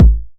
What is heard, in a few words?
909 BD; Goldbaby Tape808 & Tape909; The Tape 909